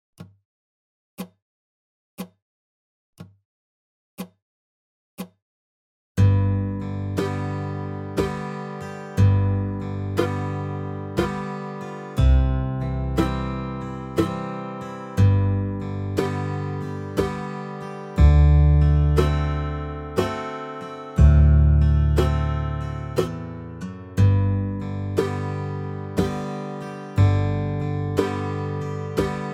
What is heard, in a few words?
backing track 60 BPM; Away in a Manger; Holiday 2025 Dobro Zoom Workshops